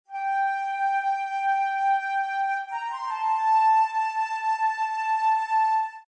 flute Dm, Flute loop in Dm at 160 bpm.; Woodwind Loops

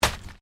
ORGANIC PUNCH; Various Organic Foley sounds; Combat Swings; Sound Effects:Combat; Foundation3

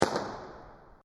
Massive Firecracker Bang; Fireworks; Backgrounds; Sound Effects:Backgrounds; CraftedSignals

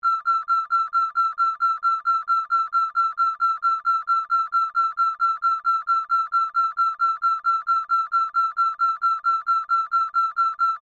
Alarm Beep, Scifi, Alarm, Beep, Science, Fiction; Tech Sound Effects; Blast Wave

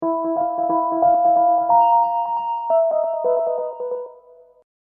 Hip Hop Dark Rhodes Licks 90 Bpm Swing E; Royalty Free Keyboard Loops

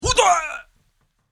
Martial Arts Yell; Yelling Sound Effects

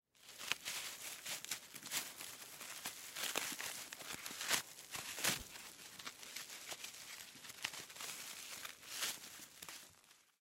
Rope Knot, Rope Tie Untie Knot; Rodeo; Backgrounds; Foley; Rodeos; Blastwave

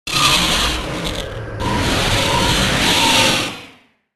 Faulty Engine, spacecraft; Icarus - Faulty Engine; Spacecraft Sound Effects; science fiction sound effect

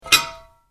Shovel: Pick Up; Yard Tool Sound Effects; Yard Tools (Sound Effects:Tools); Spade pickup; Stuart Duffield

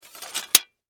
Warfare Sword Sheath Insert Scrape

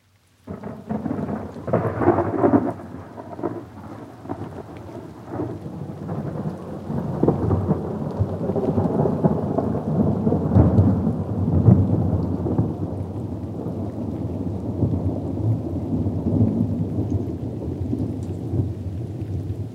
Thunder roll (with rain); Thunder (Sound Effects:Weather); BBC

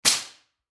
Nail Gun Remove Air Hose Burst; Power Tools; INDUSTRY

